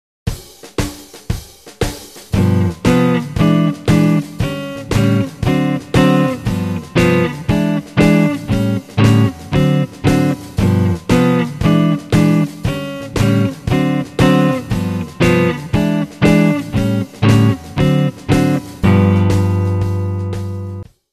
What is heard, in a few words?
Rhythm Guitar